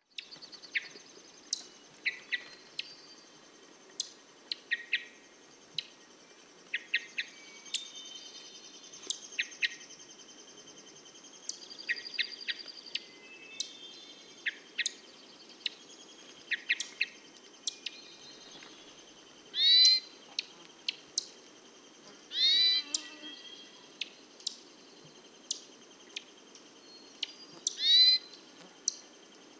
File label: Hermit Thrush Catharus guttatus; thrushes thrashers